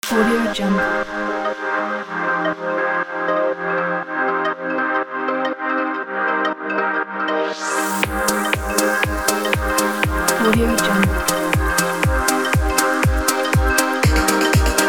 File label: house on